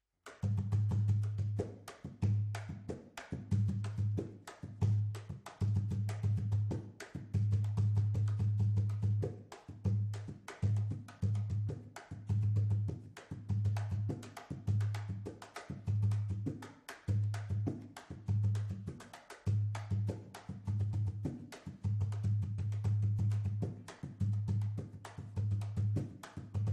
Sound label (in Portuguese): rebolo